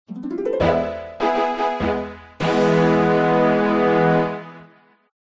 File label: level up; Fletching